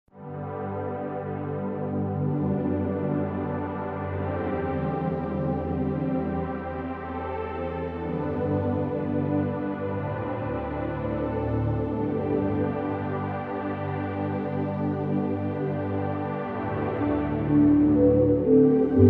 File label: dolina ska